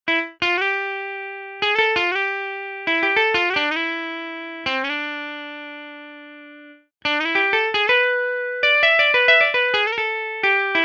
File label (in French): guitare lead; eastwest colossus